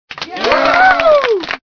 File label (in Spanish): Aplauso